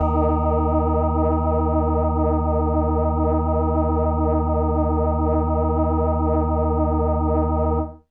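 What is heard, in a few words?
Indie Organ Kawaii D#1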